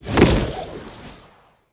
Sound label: Rappel; blue archive